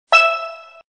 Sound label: E1 Drum, Casio